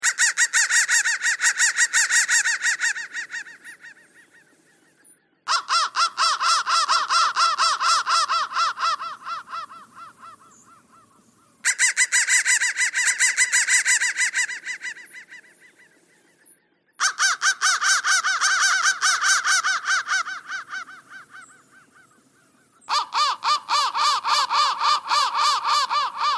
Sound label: crow crow bend threads